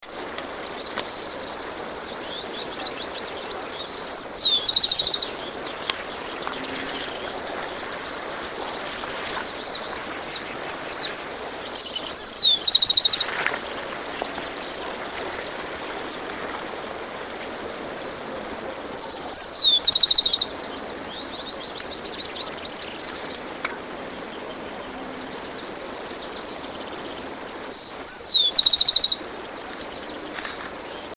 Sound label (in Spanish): chingolo, Zonotrichia capensis, Rufous- collared sparrow; cantos